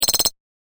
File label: Pick Up Tokens Game Sound; Video Game Sound Effects; electronic sound effect